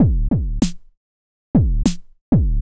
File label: Boom Boom Loop, Drum Loops Minimal; Zero-G Smoke